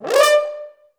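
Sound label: french horn D4; Best Service; Peter Siedlaczek's Advanced Orchestra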